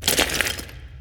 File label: lobby landing debris; smite; chiron